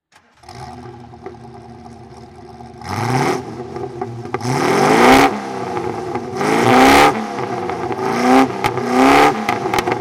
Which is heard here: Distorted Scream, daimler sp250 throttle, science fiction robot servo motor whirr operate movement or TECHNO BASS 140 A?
daimler sp250 throttle